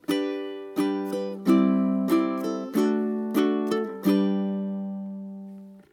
add9, ukulele

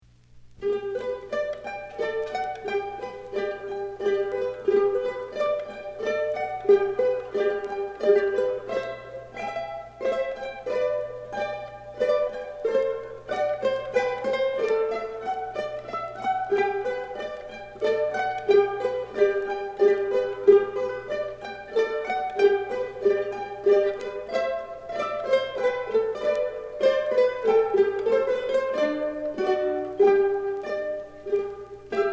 minuet in g, ukulele

